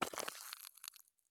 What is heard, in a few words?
walk gravel; Escape from Tarkov